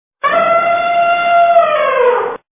golos slona pronzitel nyy vizglivyy zvuk odnovremenno napominayuschiy hriplyy gorn i skrez; skrezhet tormoz

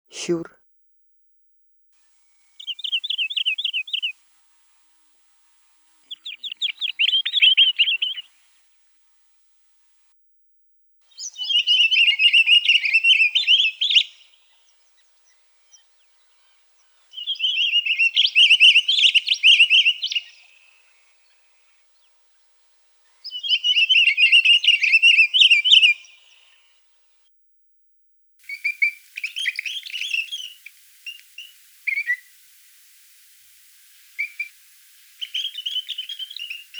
schur obyknovennyy golos

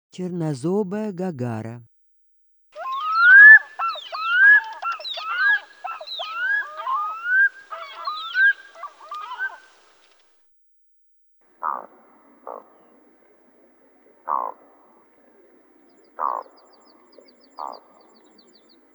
gagara chernozobaya golos

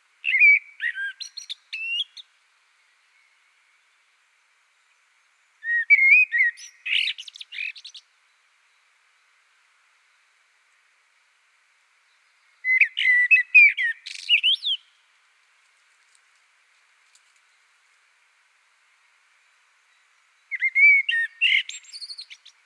Пение черного дрозда

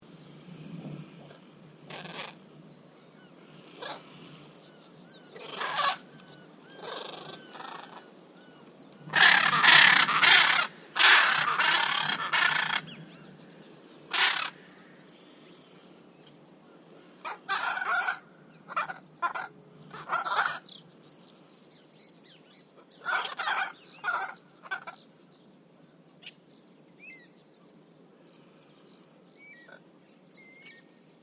Golosa ptic Giacintovyj ara Anodorhynchus hyacinthinus

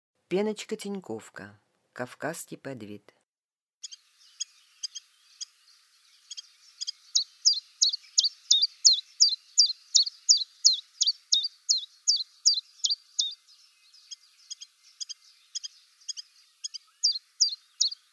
penochka tenkovka golos

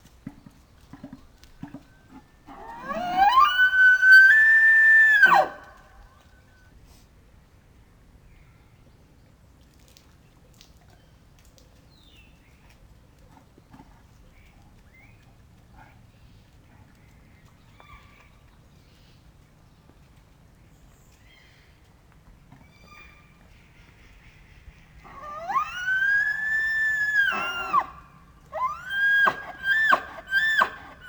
Олень благородный Cervus elaphus канадский вапити Гонный рев

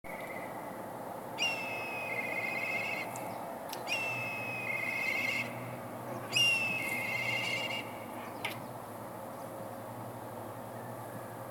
Krik korshuna

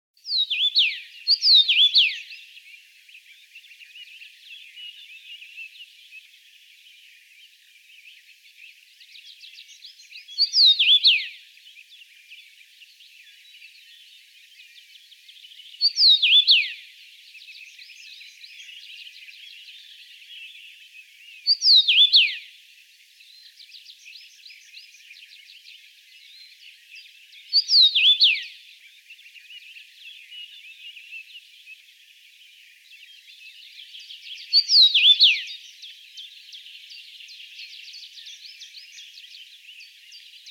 Chechevica obyknovennaya Carpodacus erythrinus Penie

